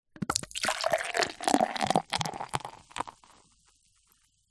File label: beer pour from bottle into glass; zapsplat